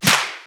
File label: Shifted Clap; FLPRO